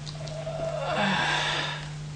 「ァァァァァァァァァアアアアアアア…」

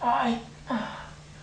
「ああいっ… ウウン…」

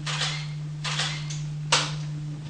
カメラの音

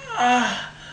「アッー…」

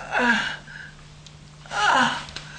ァァアッ… ンアッ…

